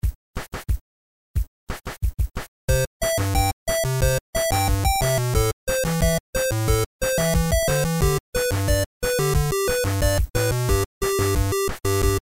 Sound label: 8 Bit